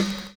SLOWPITCH

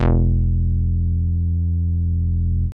CONCRETE BASS; Masterbits; Dance Trax

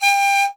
PIPE G 5, REED PIPE; Africa; Spectrasonics; Heart of Africa 1

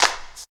combi drums

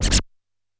E2 Scratch, SFX Kit

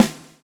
swing

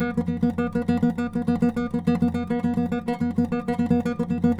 NYL TR; Spectrasonics; Hans Zimmer Guitars VOL-2; FLAMENCO FX

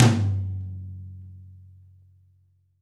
TOM L, LA TOM

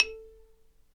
XYLO, Afro Xylophn

